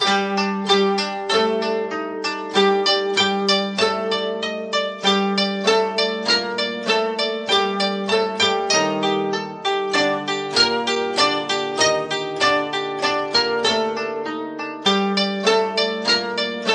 guitar

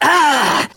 ah pain; ooh ahh

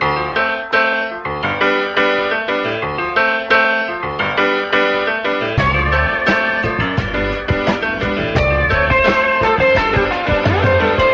piano and guitar swingers, Loops; Phonky

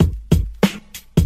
boom boom bap, boombap drum loops

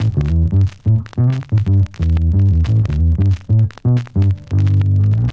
bass c note, Loops, Phonky

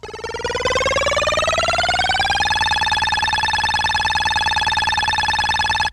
beam raising, zaps and beeps

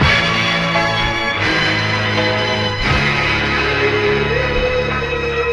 horns and voice harmonious, Loops, Phonky

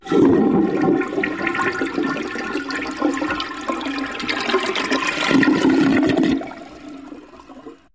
toilet flush

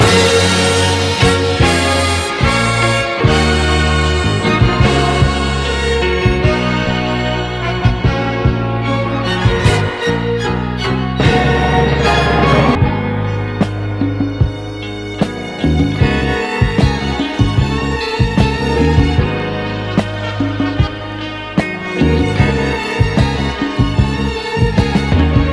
horns, Loops

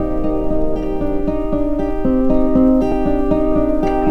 guitar melodic, Loops, Sad

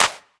Snares, Clap n Snap

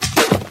backspin, Drum loops

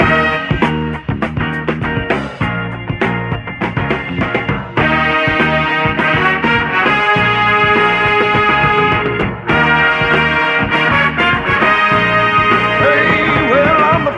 horns, Phonky loops

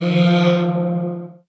"how", Voice

